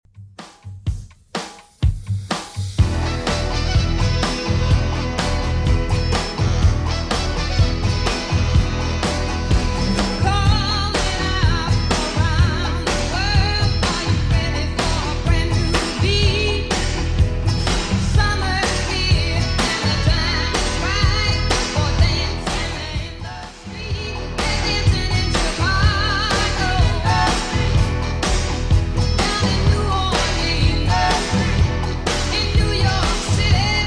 60s Redrum 125bpm

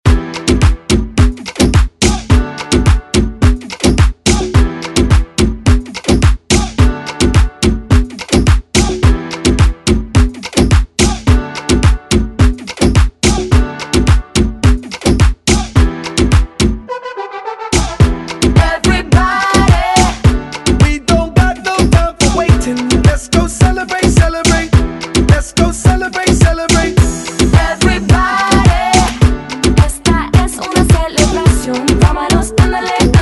Moombahton Redrum

